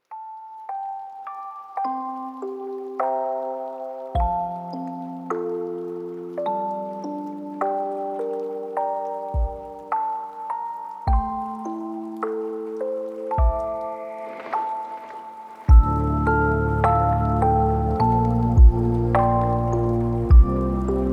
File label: Lofi Remix; Naruto